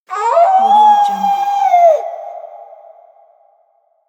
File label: ghost howling